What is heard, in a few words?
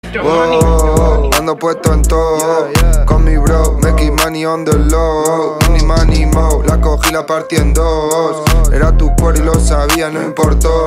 woo